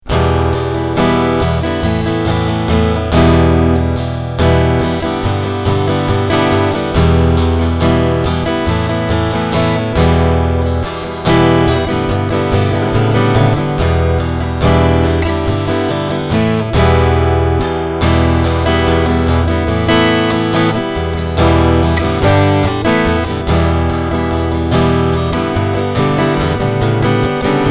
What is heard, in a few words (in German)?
Strumming Ohne CT